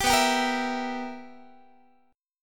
B Major 7th Suspended 4th Sharp 5th Guitar, chord; strummed

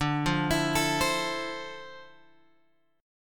D Minor 9th; Dm9 Chord {10 8 X 9 10 8} arpeggiated

